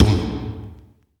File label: Beatbox Kick, Human Beatbox